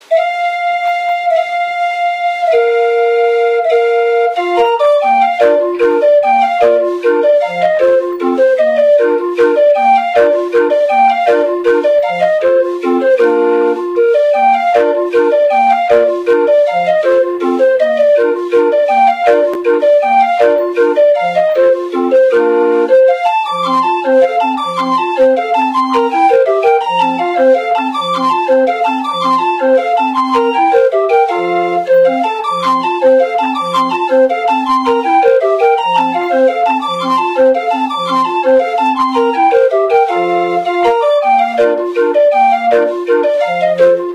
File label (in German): Walzer; Freischutz